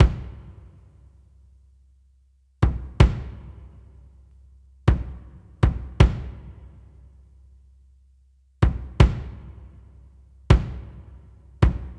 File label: 80 Kick; Guitar Hypnosis